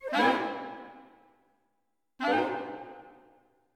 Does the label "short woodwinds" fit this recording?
Yes